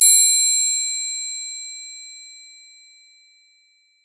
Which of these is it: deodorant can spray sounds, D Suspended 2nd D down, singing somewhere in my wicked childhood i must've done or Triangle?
Triangle